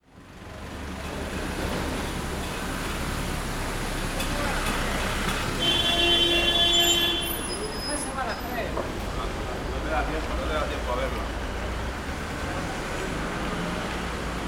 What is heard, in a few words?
Sensory Cities Standing In Front Of A Tobacco Shop